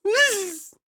histeric short; donnie; Blind Drive

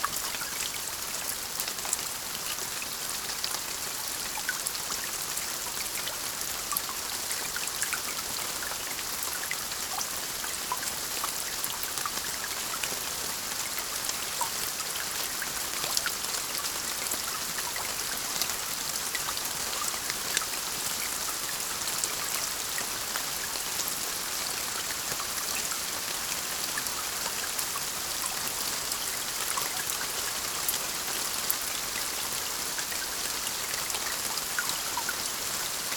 Rain consistent moderate puddles; boom library; Thunder And Rain

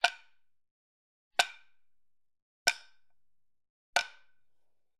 Generic Button Wood Tiny

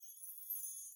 chimes; Blind Drive

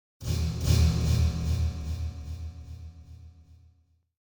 Drum Metallic Hit With Echo; Hollywood Edge; sound designer's toolkit v2

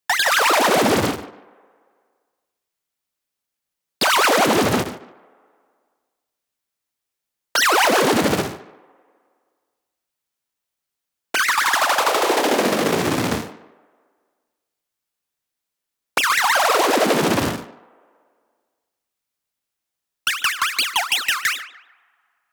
LASER Descending Delay Warp; boom library; Anime Essentials

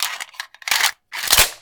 Gun Reload Weapon, Weapons Foley; SFX Kit

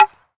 Baseball Bat Bunt

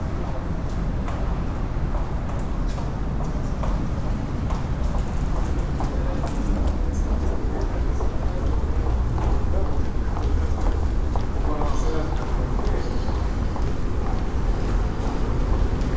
Urban train station footsteps babble trains; urban and suburban; boom library

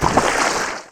Auto Tires Mud Splash; Automobiles

